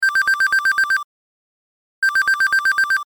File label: Telephone; Rei Yumesaki